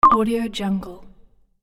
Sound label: arcade fun prompt